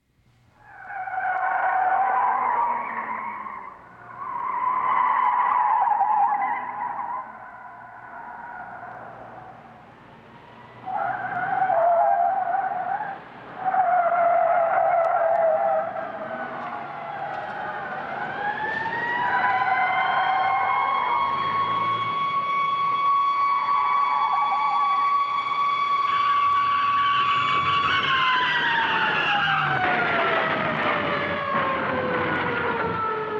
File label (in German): auto verfolgungsjagd; Großstadtgeraeusche